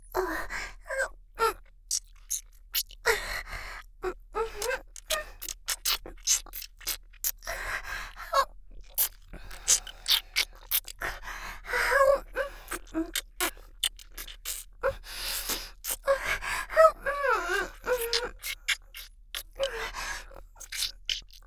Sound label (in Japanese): フェラ; ありす; リスみみの地下アイドル＋コンビニバイトAさん